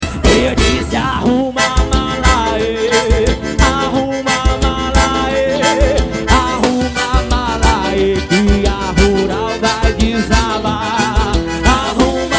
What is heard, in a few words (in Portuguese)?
forro; manaus am